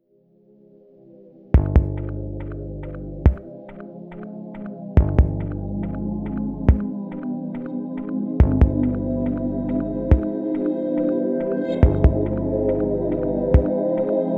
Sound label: amb, dark ambient